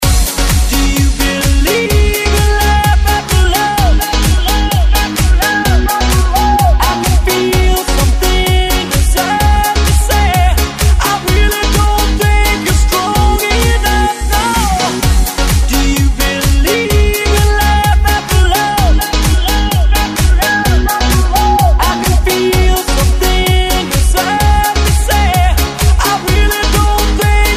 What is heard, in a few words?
remix